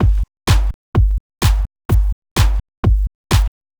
kick snare

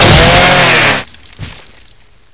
chainsaw hit bod, weapons

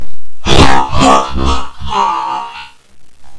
hahaha

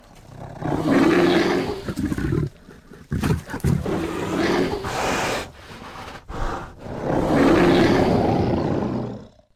bear idle

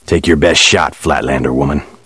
taunt; jc denton

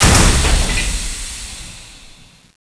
door stop

